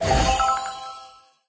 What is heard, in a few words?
Category unlock